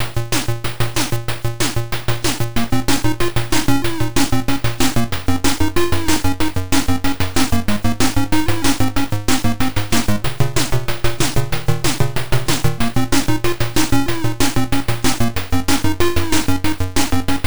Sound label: atari level 1; tnt